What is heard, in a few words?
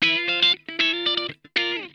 OCTALICK, Funk Guitar; Zero G - Funk Guitar